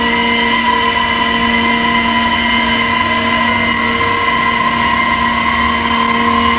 A#3 SPACE; Northstar; The Wizard VOL-1; TERROSTROIKA

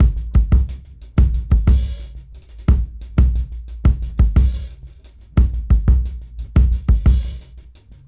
Fat Break 89 BPM; AMG - Keith LeBlanc Phat Butt Jams

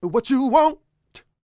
SING, Vocal Planet R&B & Dance; ILIO

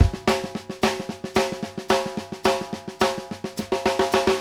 HANDS, TRAIN 108; Sampleheads; New York City Drumworks VOL-1; SP TRAIN 108

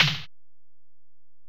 Tom, Alesis DM-PRO, Drum Machines, Toms

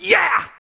VOX, Singin; Sound & Vision; Gigapack II CD 2 (Roland)

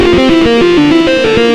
xtra lead

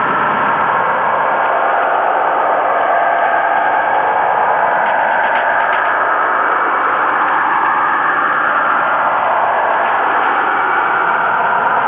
Horror Transmission, Devices & Transmissions; Sonic Foundry (Sony Creative Software) - The Electronic Music Manuscript Collection

